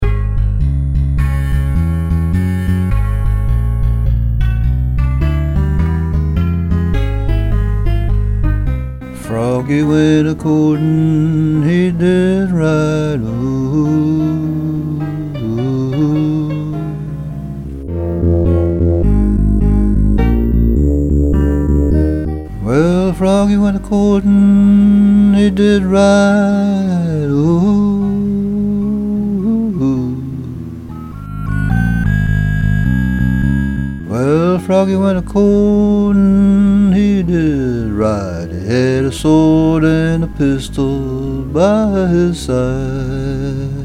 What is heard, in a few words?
Froggy Went A Courtin Vocal